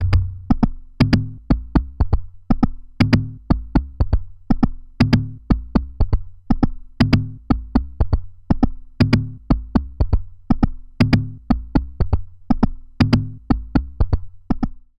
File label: Dreadbox Drips V2